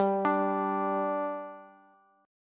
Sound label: G 5th G, Bass; G5 arpeggiated